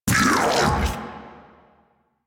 Hand Bomber Pain, Battle Barks; Warframe

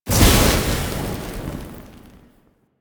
Firepit Rekindling; Warframe; Duviri; Easter Eggs

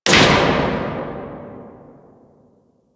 flood light switch on

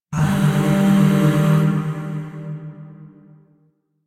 Shawzin Min Chord E; Music; Chords; Warframe; Zariman Void Shawzin

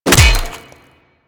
Melee Hammer Impact Flesh

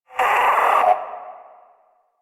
Possessed Red Veil Vocal Death; Enemies; Warframe; Priest Quest

